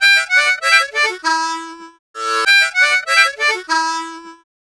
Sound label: Harm 098bpm A, Harmonica